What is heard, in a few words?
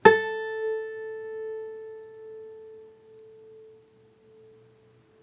string 1 fret 1